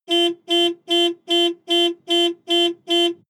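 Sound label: sports car exterior horn alarm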